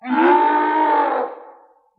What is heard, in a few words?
monster; Animals